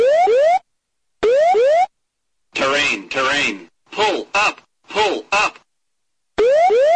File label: terrain pull up airplane alarm